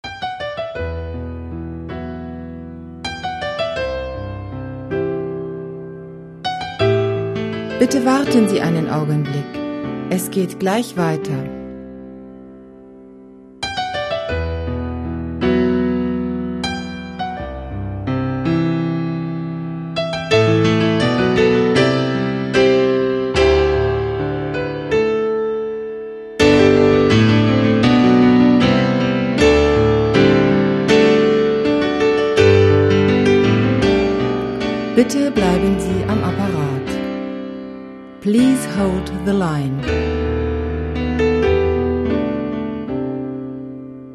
my piano demo

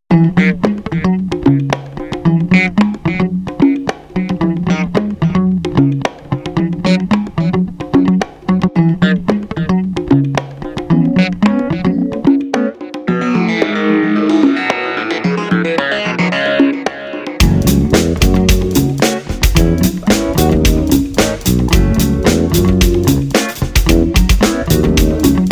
the funky craw

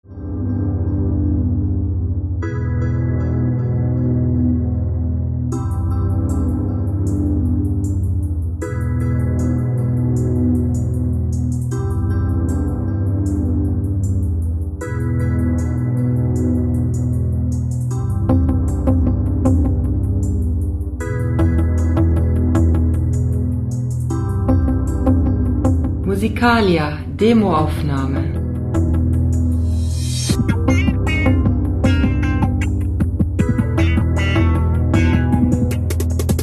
funky guitar

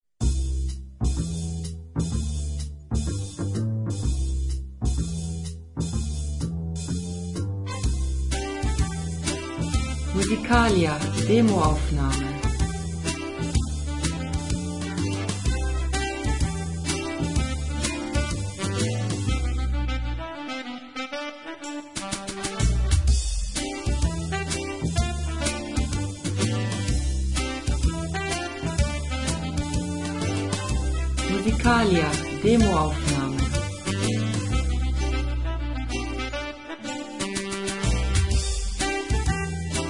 jazz time

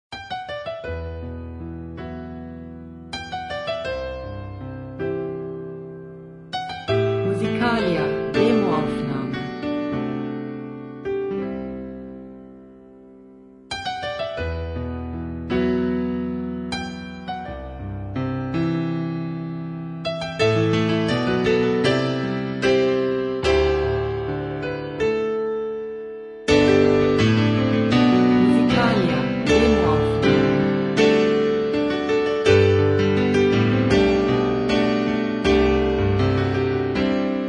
piano

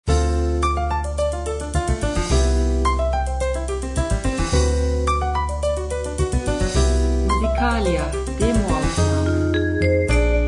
jazzclub

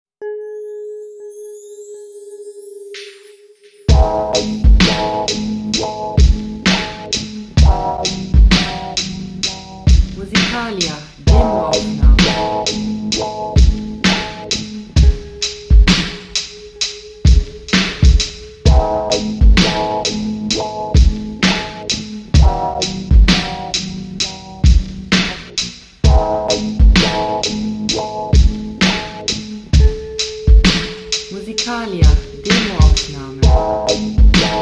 ice ambience

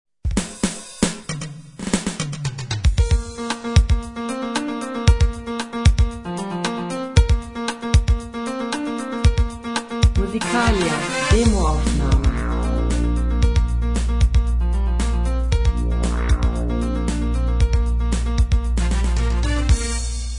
piano intro

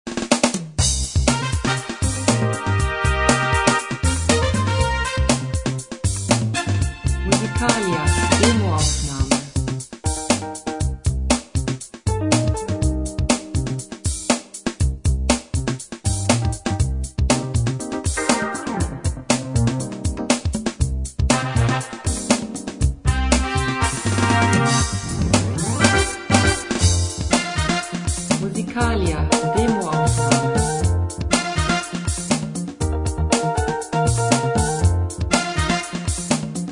jazzdance

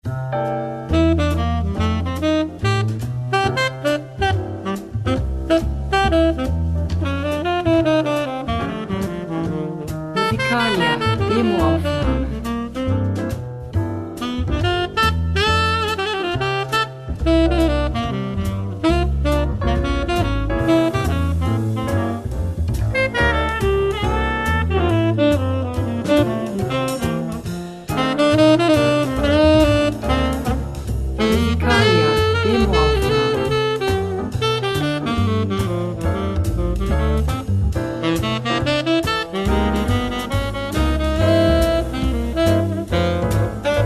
blue saxophone

